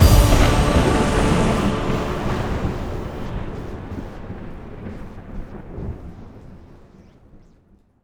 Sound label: big win end; netent